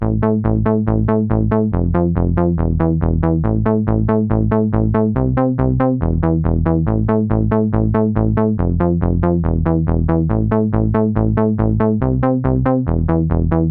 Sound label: deep base electronica; call tone; samsung